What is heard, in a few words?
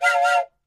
Toy Train Whistle: Double Pitch, From Close Perspective.